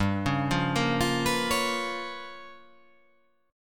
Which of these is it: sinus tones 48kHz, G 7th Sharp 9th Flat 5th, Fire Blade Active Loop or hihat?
G 7th Sharp 9th Flat 5th